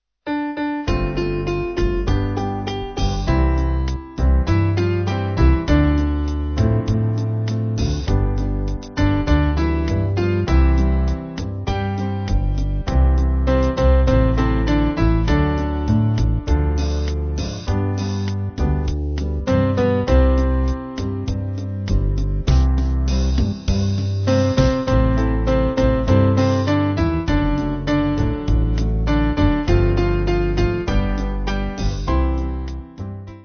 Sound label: Band